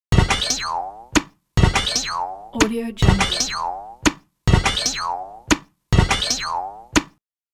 robot leg movement clunky metallic movement

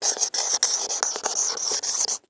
dry erase marker write; prosoundfx

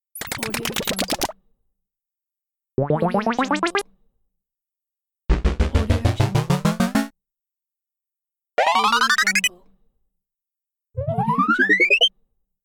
refill fx playful positive

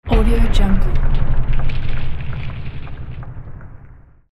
cinematic rumble hit

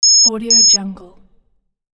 beep beep; alexm76

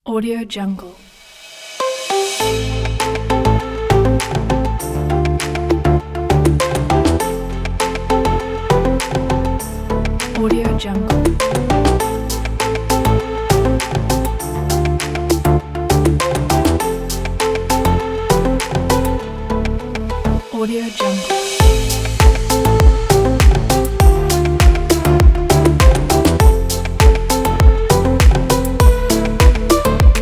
summer upbeat tropical pop happy

